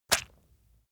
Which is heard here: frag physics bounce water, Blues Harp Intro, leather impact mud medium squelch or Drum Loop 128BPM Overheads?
leather impact mud medium squelch